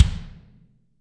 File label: BD, Real Mega Drums; Best Service; Real Mega Drums VOL-1